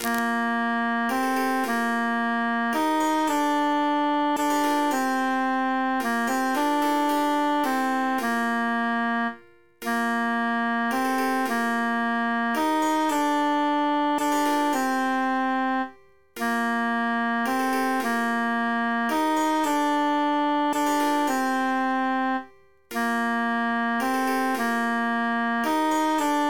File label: tenor emphasized; fi maris